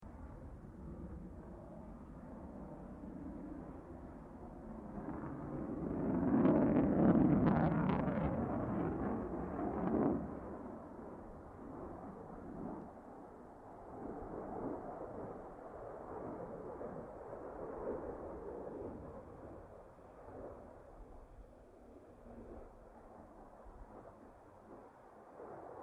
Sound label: AIRCRAFT UNKNOWN JETFIGHTER TURNING FLYBY, Afterburner; Various unidentified aircraft; Airplane; Vehicles; Aircraft Collection